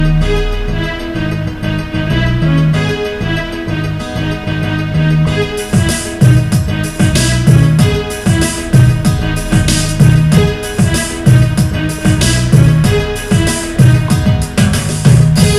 Instrumental Ringtone

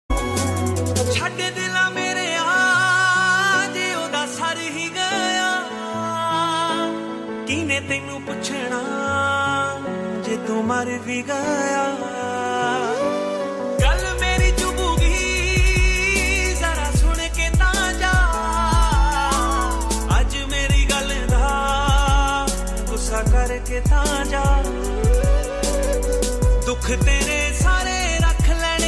Sad Punjabi

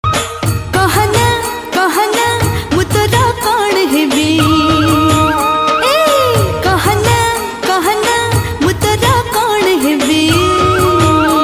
Odia Song Ringtone

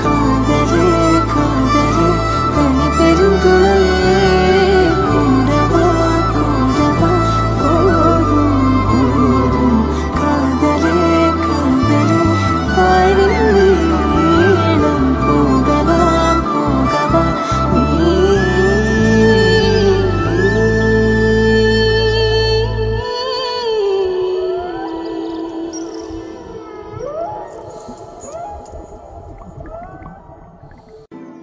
BGM Flute Ringtone; ringtones

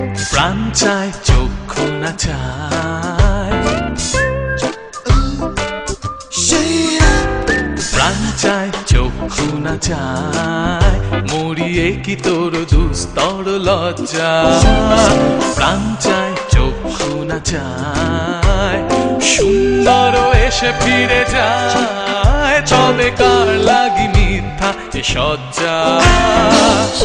Bengali Ringtone

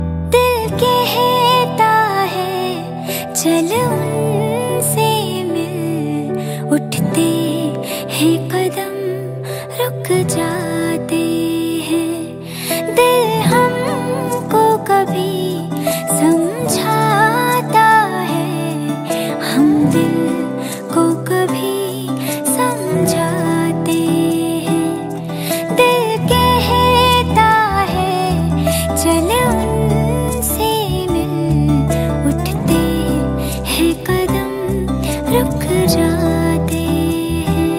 New Female Love Version Song Ringtone

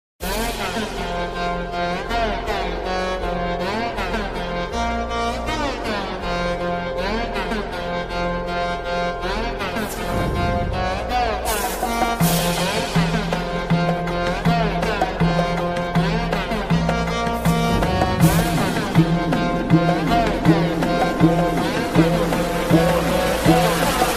Theme Ringtone; Dogs Legion

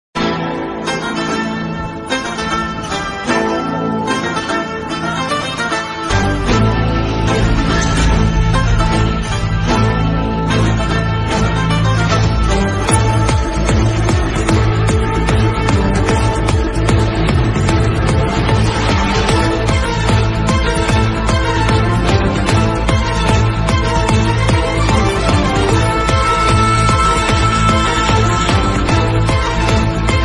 Kannada Movie Ringtone; Robert

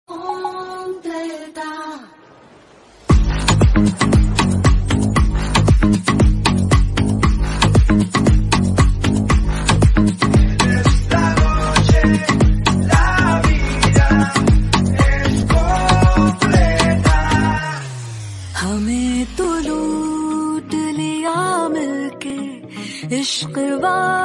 Hindi Bgm Ringtone

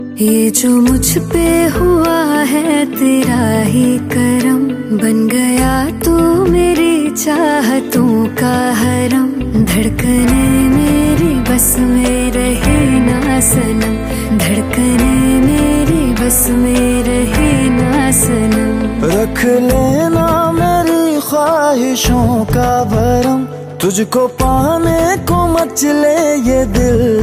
Hindi Romantic Song Ringtone; ringtones

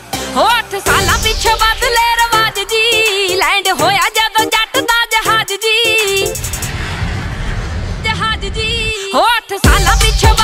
Punjabi Song

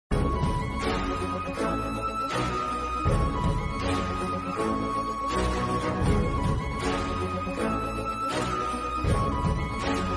Tamil Romantic Melody Ringtone; ringtones

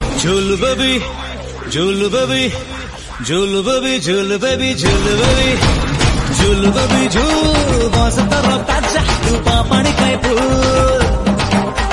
Odia Ringtone

